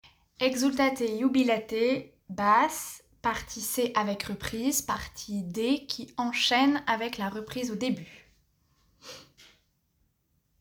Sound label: Exultate basse